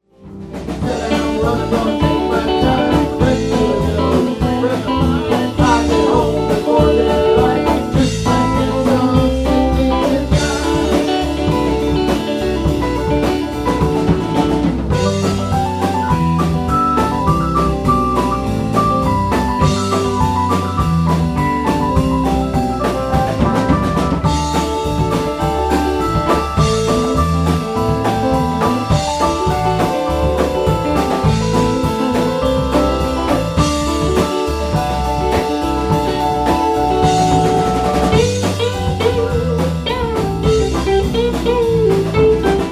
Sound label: Devil ish Solos